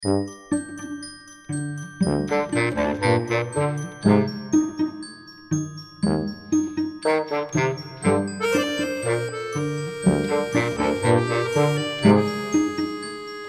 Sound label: Brass Fun